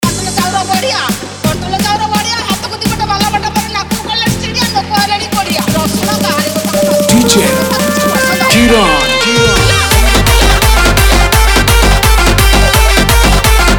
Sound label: Comedy Voice Vs Dance Music Trance Mix; Odia